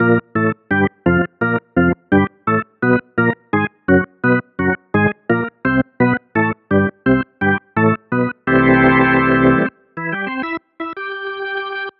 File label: Music Baseball